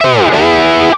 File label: window unmaximized; jam; stereo